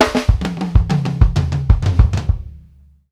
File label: drum fills; Sampleheads; New York City Drumworks VOL-1